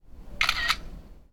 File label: Iphone Camera Click